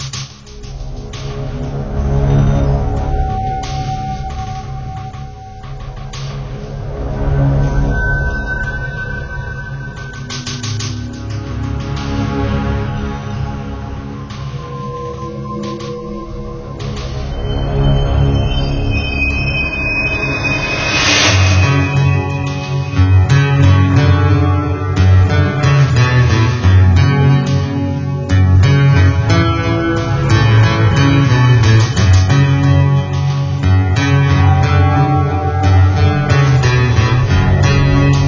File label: Ambient Loop